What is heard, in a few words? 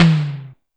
Tom, Yamaha DD-20